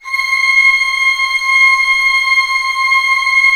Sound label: ORC C6 FF, Orchestra; Sound On Sound - Peter Siedleczek's Orchestra